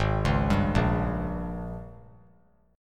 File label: G sharp Major 7th Suspended 2nd Suspended 4th G sharp, down; arpeggiated; chord